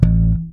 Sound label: SLP POP A, PEDULLA BASS; Masterbits CLIMAX 7 - Bass